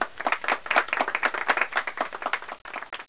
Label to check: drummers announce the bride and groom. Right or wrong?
Wrong